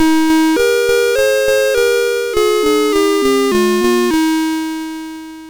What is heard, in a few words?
sid MOS6581R3, GAMES; Alice In Wonderland